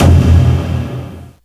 taiko normal hit finish; JesusOmega {DT} 『Planets』

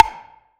drum hit clap; megarekt

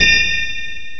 spinner bonus; Amasetic4.0; gnahus

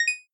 match confirm; Tippy

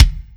drum hit normal; izduh v4 blue simple

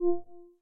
menu freeplay click; Luxariah Default Minimal

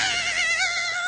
spinner bonus; minionalist

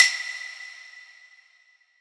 normal hit Whistle; osu skins; izduh v4red temu

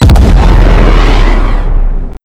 fail sound; Clicking Head

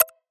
check off; 『Mikan』

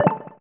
pause retry click; Crimson Stella

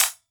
soft hit whistle; Crimson Stella

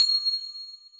soft hitwhistle; AriizuCA's Skin V3.17.6 Tail Yellow

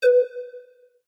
pause retry click; Spazza17 v2 [fumohere]

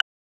key delete; Performance; AlexGreeningham

